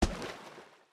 landfill medium; Factorio

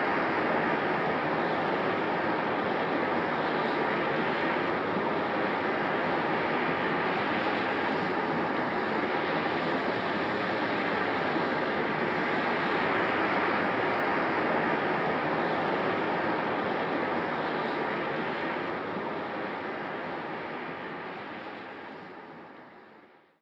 poison cloud, fight

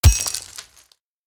axe mining ore; Factorio

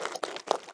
biter walk, creatures

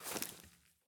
bush small, walking, plant; Factorio

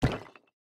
build concrete medium; Factorio

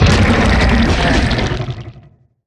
worm death big

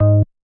bass, programmable speaker

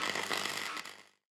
robot repair; Factorio

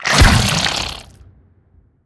spitter death behemoth, creatures

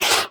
biter roar, creatures

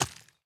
ore, walking; Factorio

